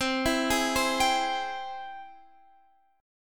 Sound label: Ab Augmented Major 7th C, guitar